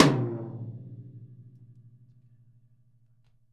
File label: VINT KITS; Sonic Reality - Vintage Timetraveler